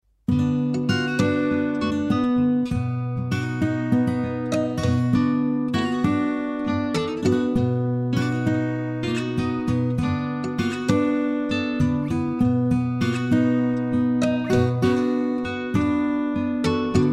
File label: STRING FINGERS